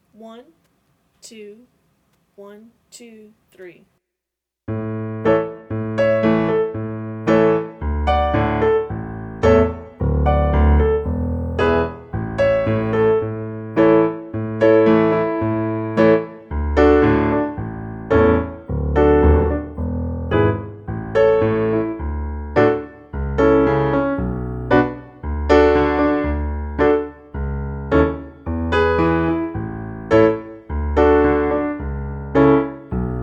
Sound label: Piano; Miss Wedderburn